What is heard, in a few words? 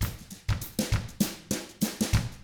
FUNKY, Drums